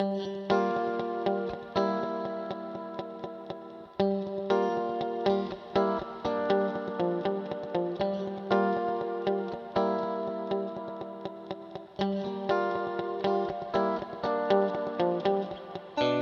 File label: Guitar Eventide H8000 St Plate Chorus; Vintage Digital